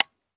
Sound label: akilo47 disconnector; weapons; viper